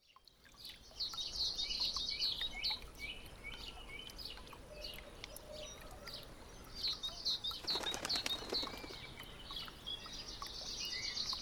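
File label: sound of spring dripping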